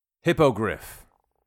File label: hippogriff; bestiary; 5etools